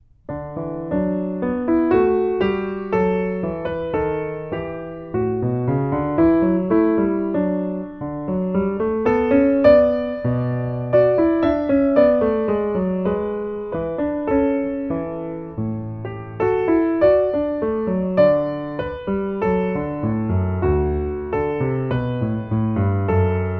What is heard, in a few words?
Dulcimer